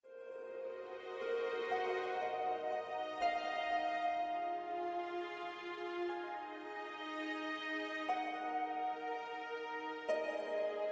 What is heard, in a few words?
light ambient